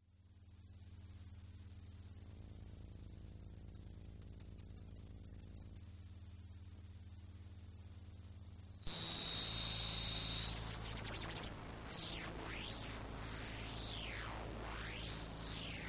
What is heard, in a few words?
Moog Modular